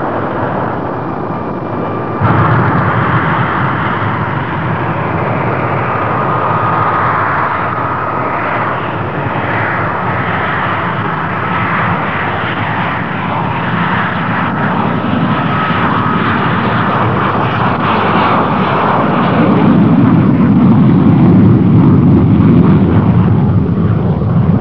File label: takeoff, f-106 delta dart